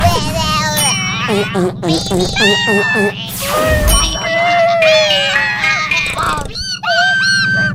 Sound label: weird noises; bfdi